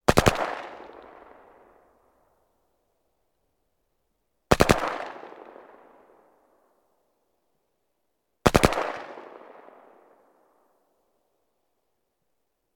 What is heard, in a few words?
gun ak 47; still north media